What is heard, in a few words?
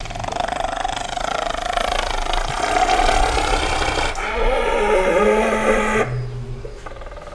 Hyena Growl Throaty